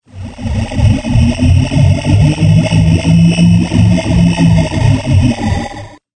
spaceship wont start

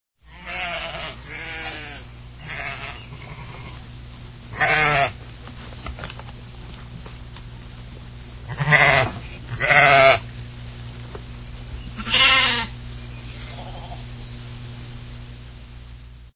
Sound Effect Sheep Baaa

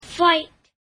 fight

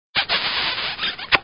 Kiss

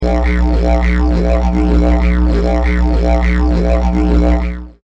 didgeridoo

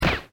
BANG GUN

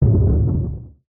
bomb

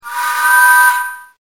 Train

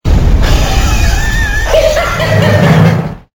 laugh

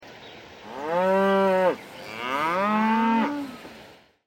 cow farm, Cow

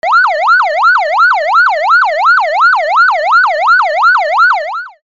siren

